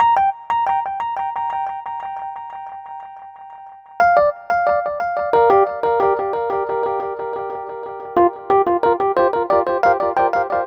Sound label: 090 NuJazz Echo MarkII Gmaj; Piano; E-Piano; Pro Tools Essential Loops; Digidesign